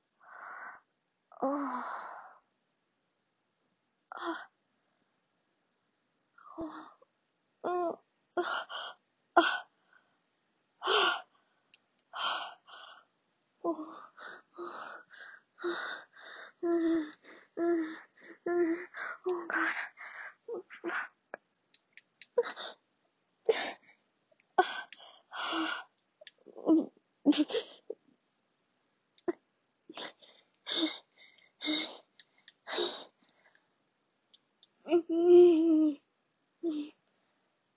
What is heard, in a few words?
Short Moaning Audio